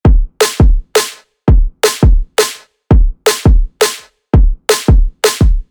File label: Drum Bass Drum Pattern